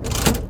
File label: lever, ships; Cosmic Rage; ships misc